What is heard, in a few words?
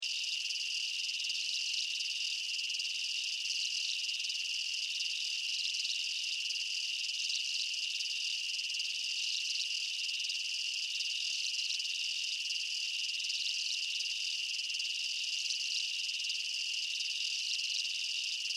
Amb Night Jungle, Ambience, Insects, Chirps, Night, Jungle, Bugs; Forests And Jungle; Backgrounds; Forests and Jungles; Blastwave